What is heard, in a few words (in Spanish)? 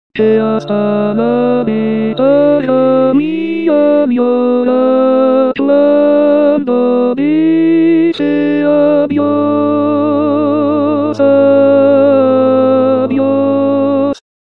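coda tenor, choir voice part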